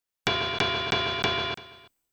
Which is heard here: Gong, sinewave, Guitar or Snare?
Gong